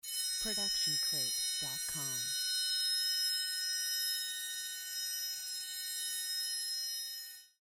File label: triangle roll